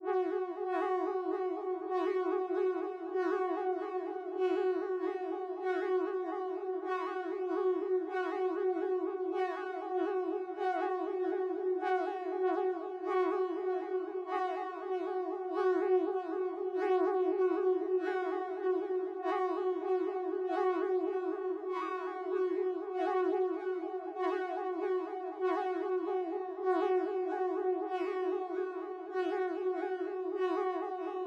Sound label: 97 BPM F# Min Drone, West Coast; Melodies; Loop Stems; Cymatics; GHOST (Beta Pack); Alley